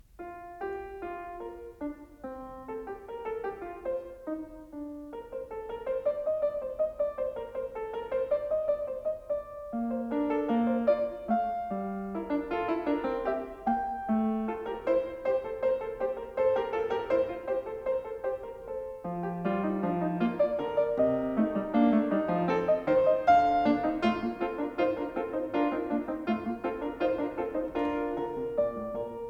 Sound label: Fugue in B flat major; BACH; WELL-TEMPERED CLAVIER; book I; BWV 866; Prelude-Fugue No.21